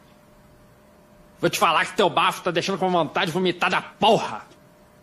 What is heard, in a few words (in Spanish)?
vomitar